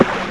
splash; madbomber-0.2.5

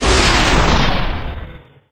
attack; planeshift

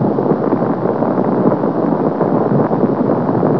gravel; trigger

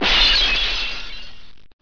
glass Shatter; blobAndConquer; combat

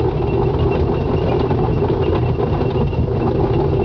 Tread; freeciv

